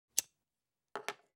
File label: Lighter Light and Down; Fairlight Sound Lib